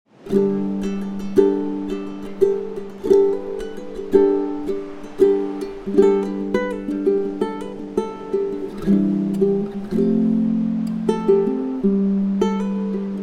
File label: Healing Ukulele Cafe BGM